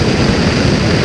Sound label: heat blowtorch; Crazy Machines; Играет вся семья